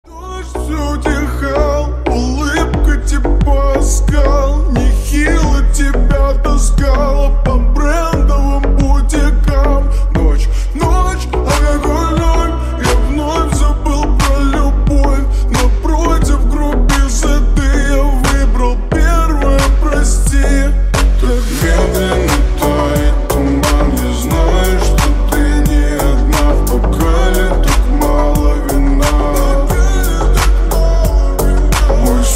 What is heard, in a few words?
slowed